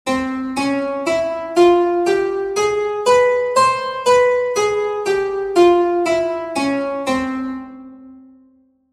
gypsy scale

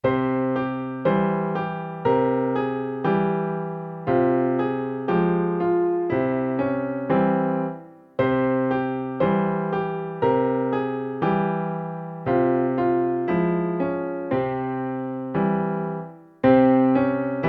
gypsy dance

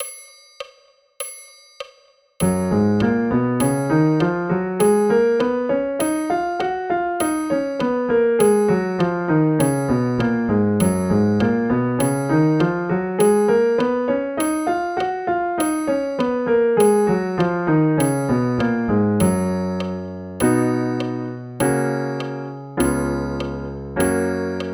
Gb Major Scale and arpeggio; bastien

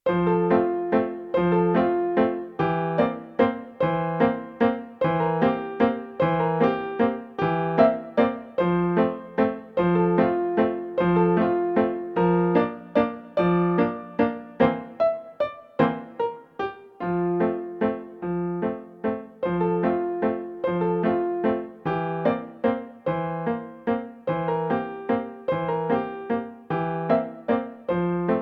viennese waltz

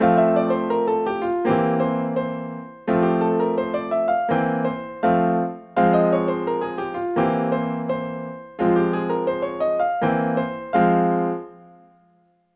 16th note in F and Fm

